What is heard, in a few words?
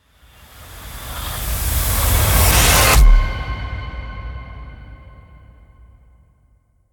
Main Ambient; Doom Eternal; PS4; Stadia; Xbox Series X/S; Blood Harvester